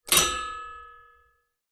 impact metal frame very small

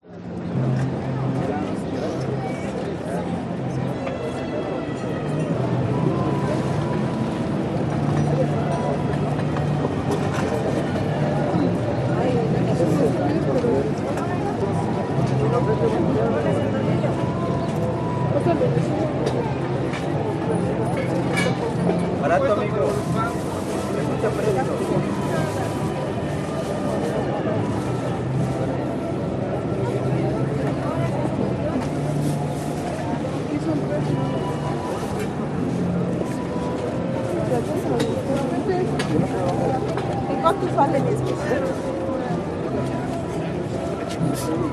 Mexico Market; audio hero

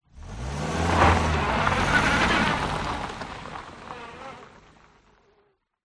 vehicles car reverse past dirt gravel

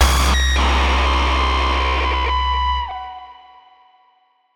Dark Age Synth; martyn stonehouse

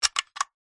warfare gun mechanism clicks

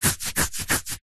human itch scratch hairy leg

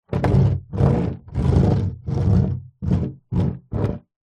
foley wood large board drag ground

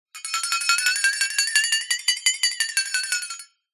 cartoon flexatone wobble shake unsteady tone; zapsplat

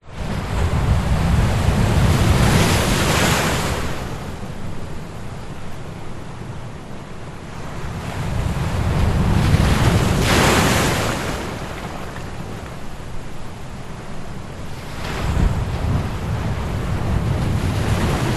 Waves Large Beach Calm Hissing; skyes audio

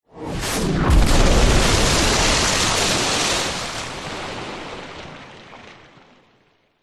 warfare missile hit water bomb splash large; zapsplat

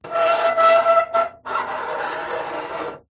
foley metal scrape large loud with squeak

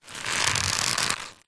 rope thick old tension movement creak stress

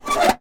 metal handle movement squeak rusty old; zapsplat

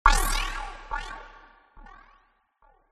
fm stab stutter digital; sound design

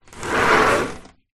household screen door insect slide

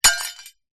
impacts dish ceramic drop light smash

